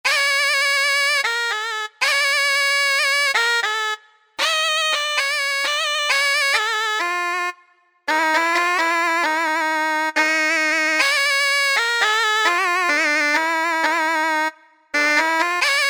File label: Shenai